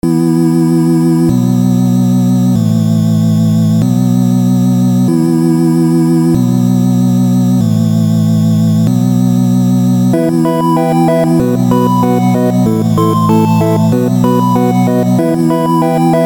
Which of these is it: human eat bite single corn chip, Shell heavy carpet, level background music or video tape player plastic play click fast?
level background music